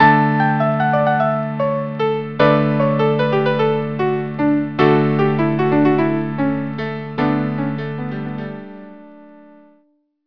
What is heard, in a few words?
Melody trio; norwegian